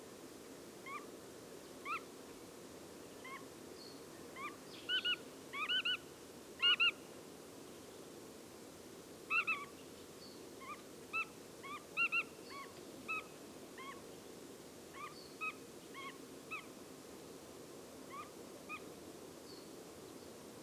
Rosse Grutto Limosa lapponica

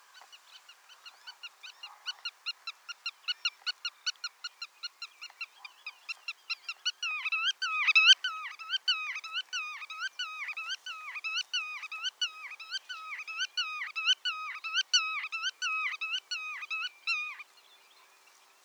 Grutto Limosa limosa

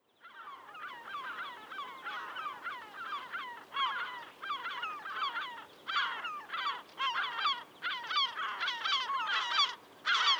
Zilvermeeuw Larus argentatus

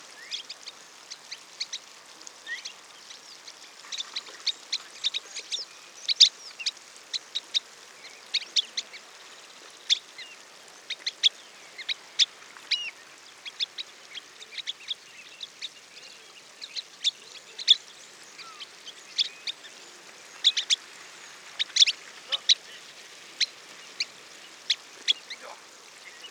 Drieteenstrandloper Calidris alba

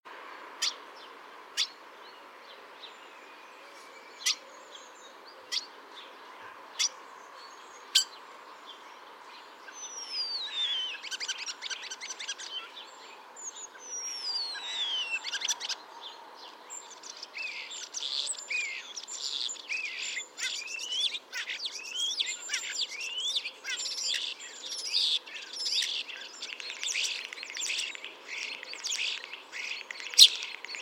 Spreeuw Sturnus vulgaris

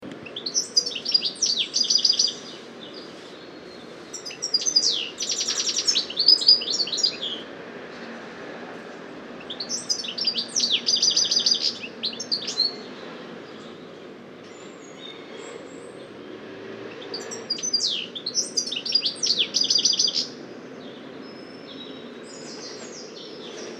Putter Carduelis carduelis

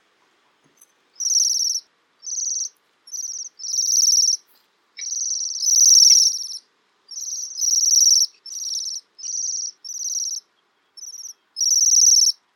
Pestvogel Bombycilla garrulus

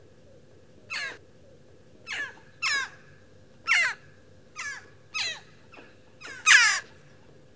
Kauw Coloeus monedula

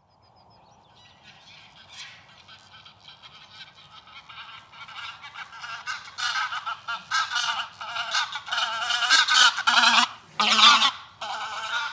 Grauwe Gans Anser anser

